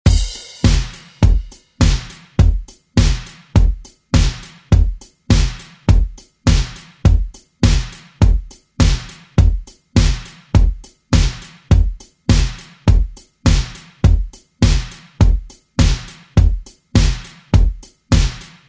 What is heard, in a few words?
80s Re Drum; DJ Mhark